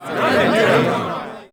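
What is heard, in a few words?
elves murmur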